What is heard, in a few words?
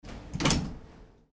underground train door close